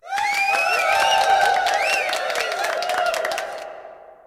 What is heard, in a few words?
win; soccermove